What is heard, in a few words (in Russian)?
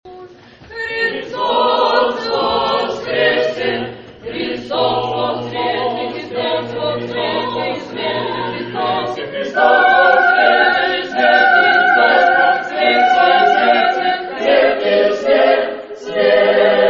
Тропарь Пасхи Колокольчик